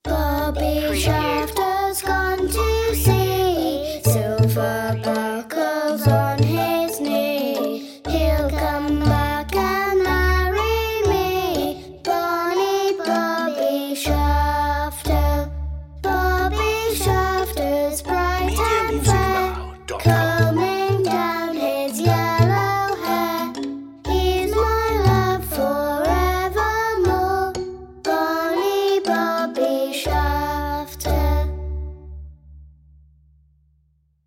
children vocals; bobbie shaftoe

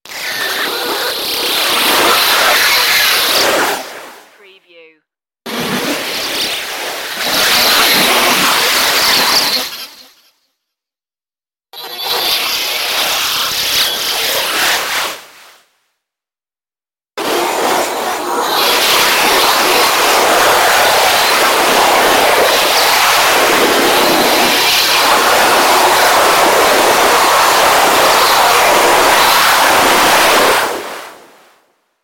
alien chaos storm

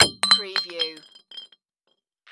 Glass Bottle Drop

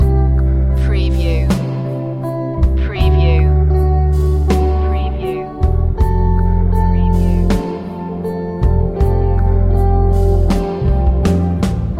delay drops loop

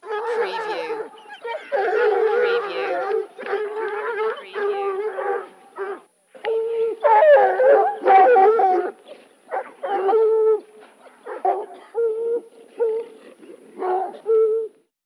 Dogs Hounds Pack Running

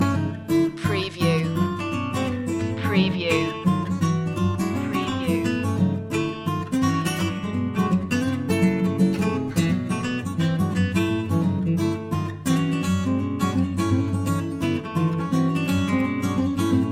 bar room rag loop

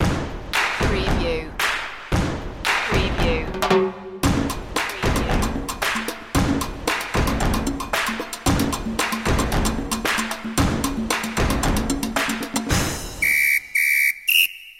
rhytmic soul loop

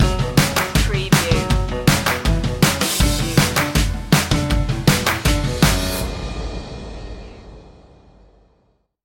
funky hop stinger

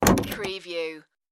room door close

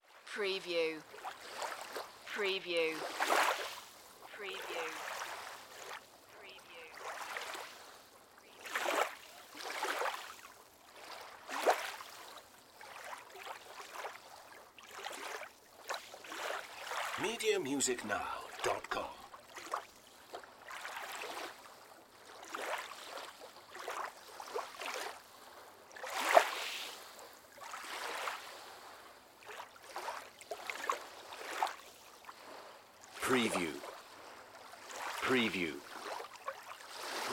Lake Pier Small Waves; Sound Effects Bible

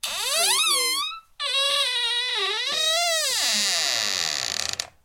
Door hinge squeak; beanstalk audio

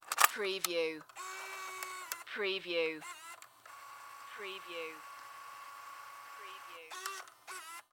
DV Camera Close Deck

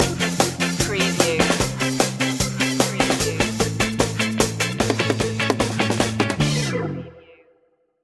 ska stinger

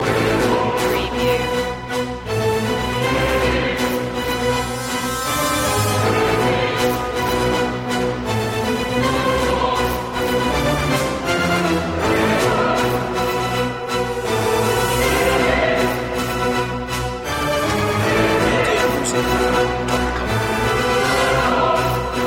no perc

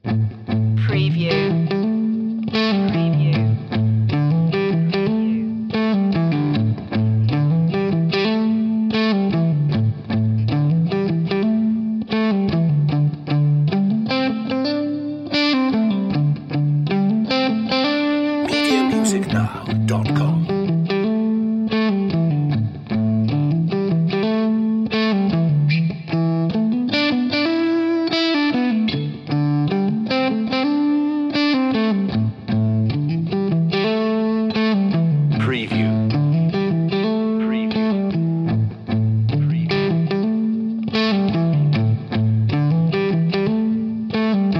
rough guitar underscore

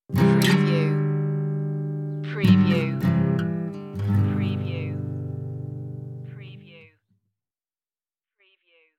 Aco Strum

